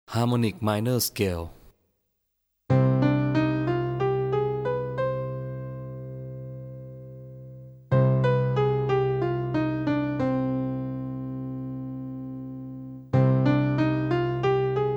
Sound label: harmonic minor